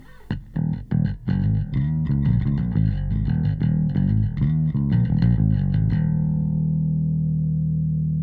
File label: bass